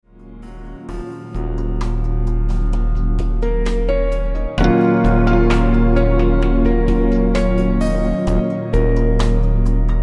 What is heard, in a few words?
Meditation Mix